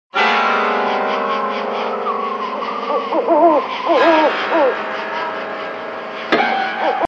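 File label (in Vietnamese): tiếng cú kêu trong đêm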